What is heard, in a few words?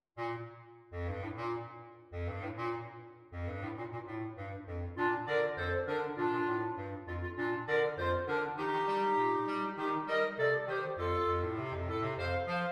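Jingle Bells clarinet clarinet bass clarinet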